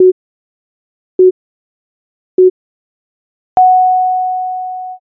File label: jiho